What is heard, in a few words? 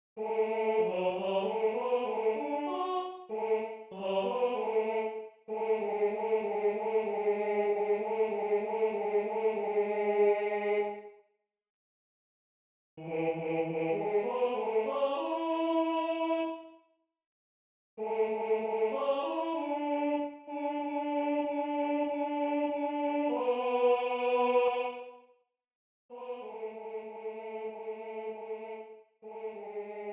Tenor; Die Waldvogelein; Mendelssohn